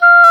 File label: WND OBOE Dry; Roland LCDP04 Orchestral Winds; Oboe 4-8; Oboe Dry 6